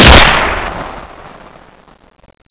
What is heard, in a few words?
Kar shoot